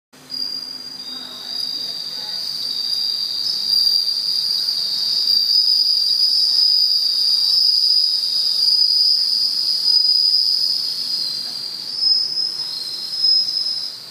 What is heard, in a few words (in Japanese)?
suzumusi